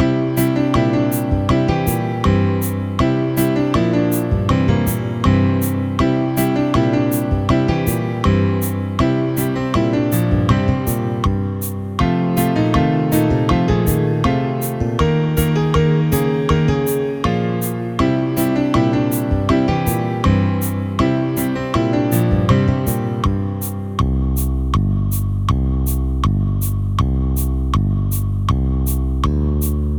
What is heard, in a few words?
ringtone; supertux; openmoko